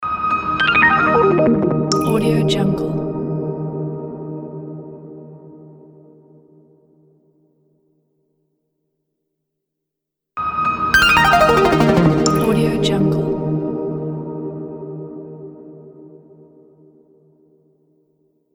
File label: dropdown stripes logo